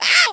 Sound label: ow, Voice ooh ahh ow ouch